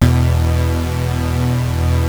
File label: Prophet 5 B2; Hollywood Edge - Giorgio Moroder Rare Synthesizer Collection